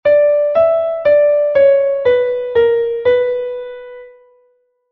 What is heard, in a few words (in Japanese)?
ロ短調の例題